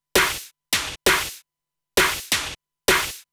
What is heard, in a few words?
Snare Loop, Dance Beats